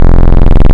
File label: Pulses, wavetables; Animoog